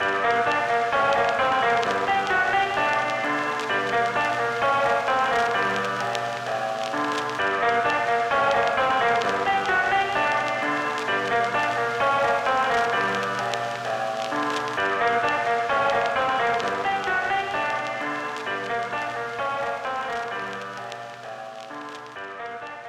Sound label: Gramaphone Music